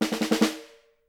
5 HITS, Percussion; Miroslav Vitous - Percussion & Harp

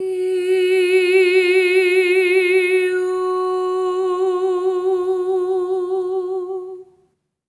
EH OH F#4, SOP; Spectrasonics; Symphony of Voices

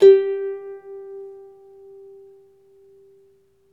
CELTIC HA, Celtic Harp

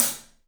Paiste hat, drums; 3D Sound

